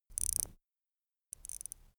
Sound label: watch wind up